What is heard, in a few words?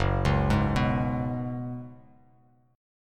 Gb Suspended 2nd Suspended 4th, Bass Standard; Gbsus2sus4 arpeggiated